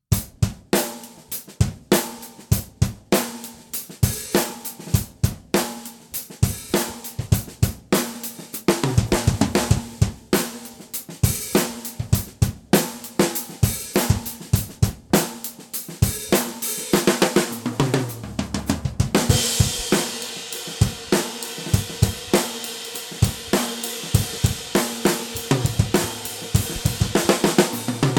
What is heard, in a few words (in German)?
Set komplett tief